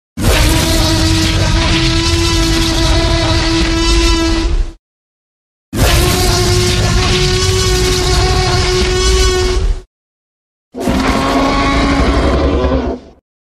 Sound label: t rex ringtone